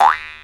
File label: Jaw Harp Owe; Multisamples; Digital Sound Factory Planet Earth